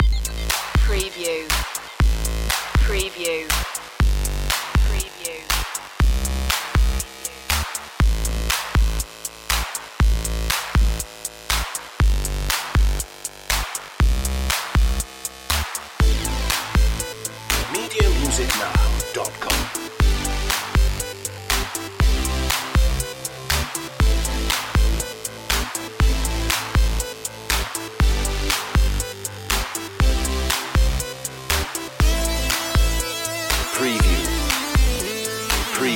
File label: electro chip long looping